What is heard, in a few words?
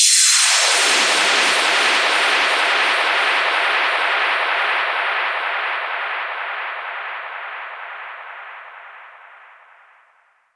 Downsweeps